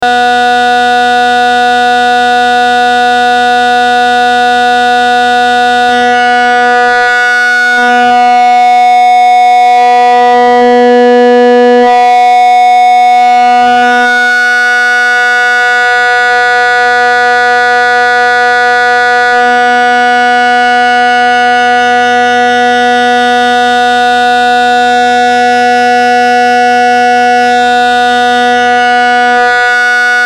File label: inharmonicity of overtones